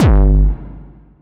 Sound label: Kick